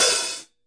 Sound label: half open hi-hat; DRUMS (SINGLE HITS); Hats